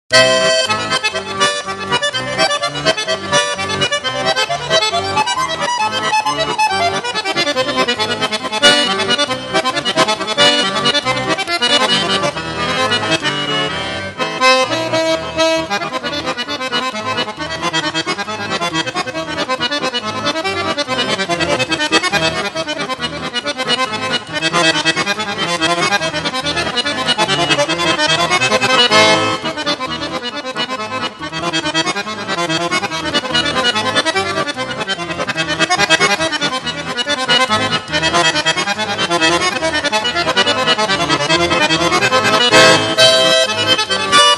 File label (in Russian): Кадриль; Минутка